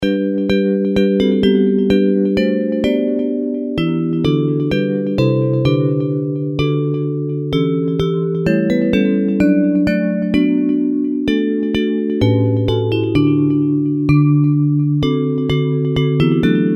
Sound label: Bells, hymn